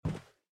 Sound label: land wood; Out of Reach: Treasure Royale